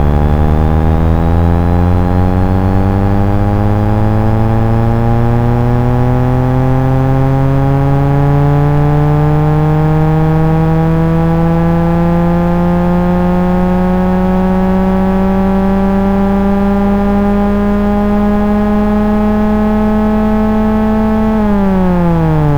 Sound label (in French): acquisition banc puissance honda hr v; Copie 3 Acquisition Banc Puissance Honda HR-V Original